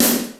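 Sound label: power snare drum, Roland JD-990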